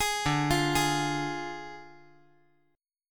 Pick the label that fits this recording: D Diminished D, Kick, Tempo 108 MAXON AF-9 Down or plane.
D Diminished D